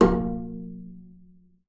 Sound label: BING A#3, BASS FINGER; Xsample VOL-5